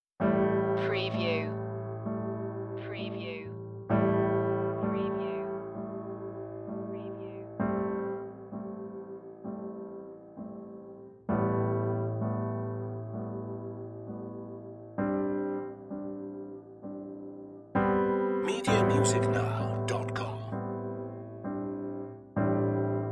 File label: emotional piano cue